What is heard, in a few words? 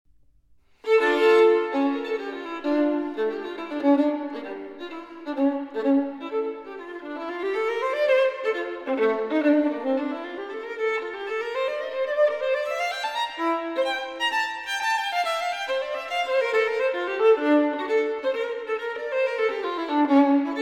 Partita In D Minor Courante; Partita No 2 In D Minor Bwv 1004 2 Courante